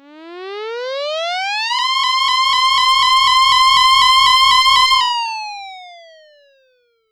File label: Siren, YAMAHA RM1X